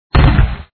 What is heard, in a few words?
explo; bump copter